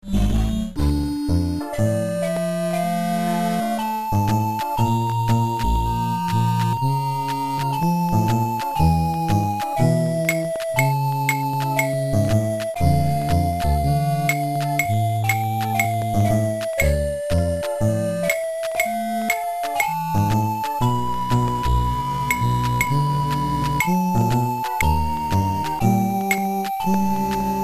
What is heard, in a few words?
Voodoo swamp; Amiga